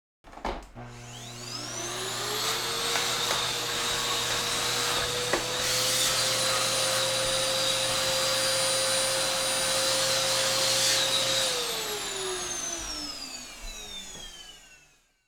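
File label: soujiki, vacuum cleaner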